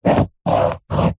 office pen ballpoint paper hollow table draw triangle; zapsplat